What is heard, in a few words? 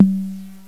Analog Tom, drums; GMPlay; XG